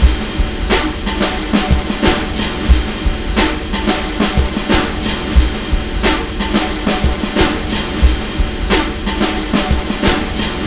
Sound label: drum loop